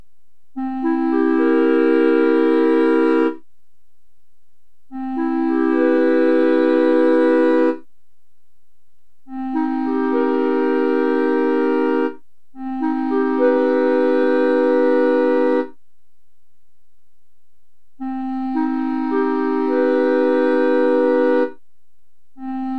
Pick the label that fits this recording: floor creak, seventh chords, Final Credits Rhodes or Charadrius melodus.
seventh chords